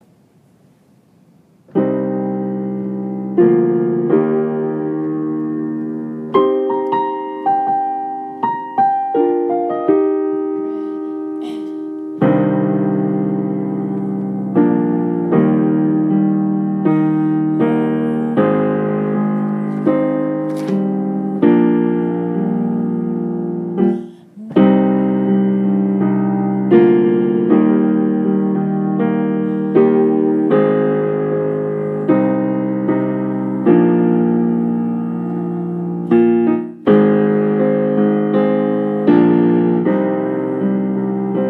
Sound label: solo just piano